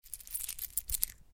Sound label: Coins